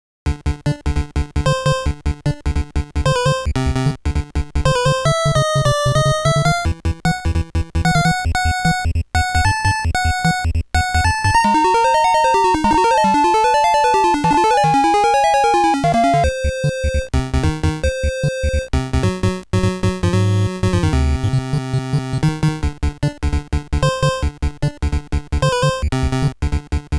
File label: Beat Back (Stage 2 BGM); Gradius; Ultimate Collection